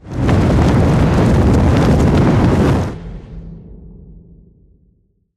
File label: Fastball Whoosh; Beacon; Titanfall 2